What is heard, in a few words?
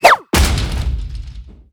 Character Attack